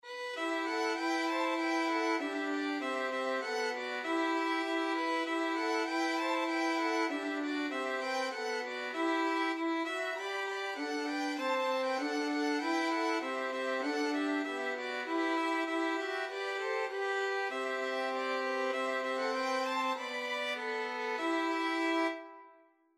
violin trio; huron carol

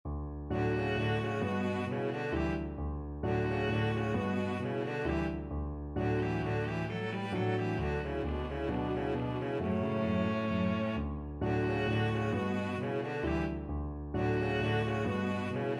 viola cello duet; lakme

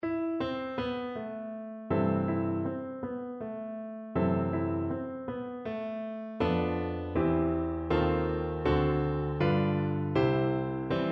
piano, clarinet

